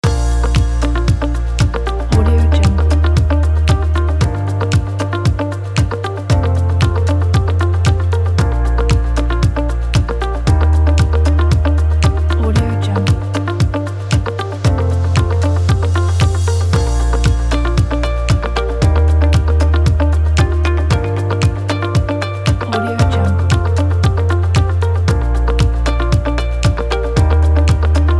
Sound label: inspiring music kit